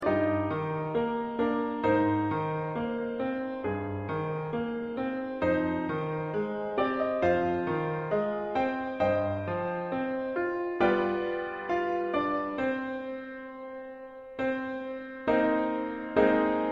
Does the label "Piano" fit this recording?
Yes